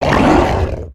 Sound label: melee; weapons; aronwk collection; ndaudio; Man Eater